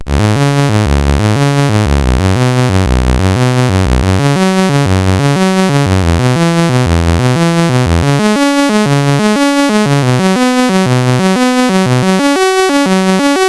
Percy Penguin, CSG8580R5

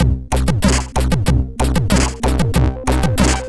tune, MOS6581R4; Serpent

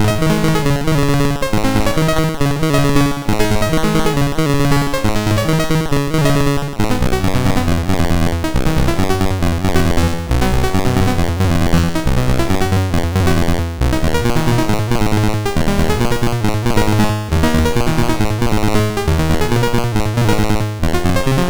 Randomly generated music BASIC, sid MOS6581R2

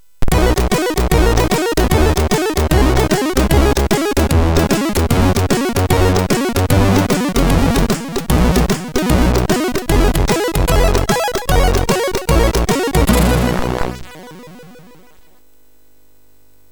Level complete, sid MOS6581R3; hvsc

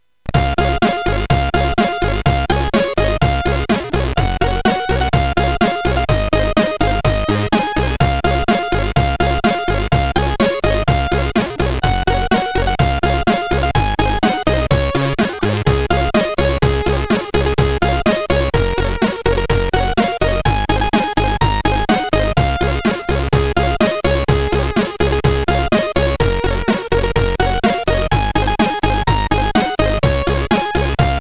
Jinglebells, sid; hvsc

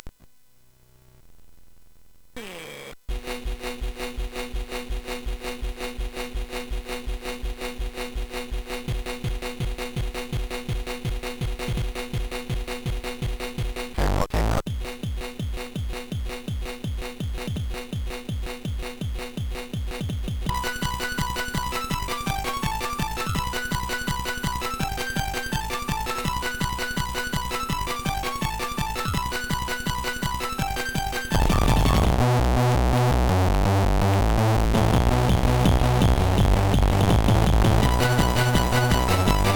Techno, sid CSG8580R5

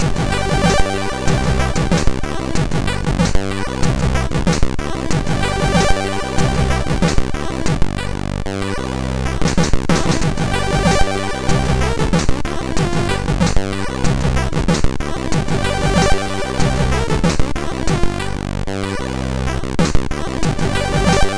house party, MOS6581R2

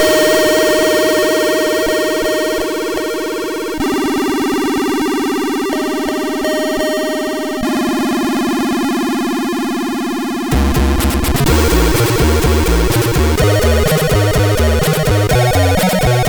sid MOS6581R4; HVSC; Icecream end Remix

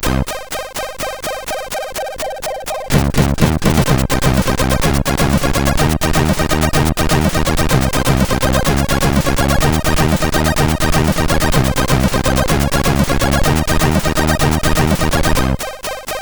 Static House, sid, CSG8580R5

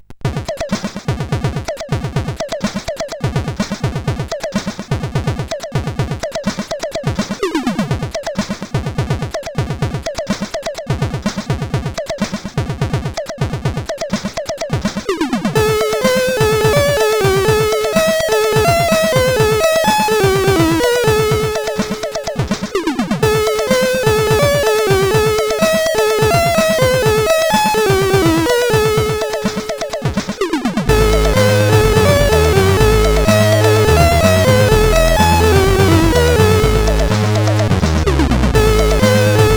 music, sid CSG8580R5

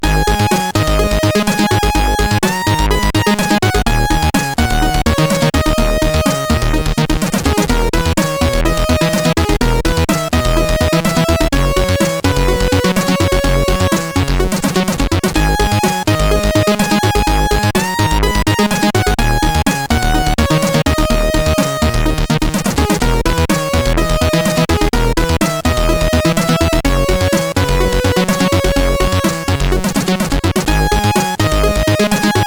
end sequence, sid CSG8580R5; Solitax

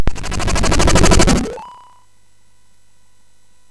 sfx, MOS 6581; hvsc

